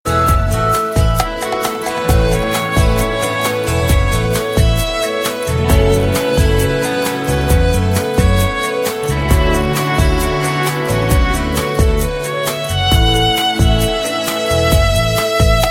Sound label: Soft Music; Dabangg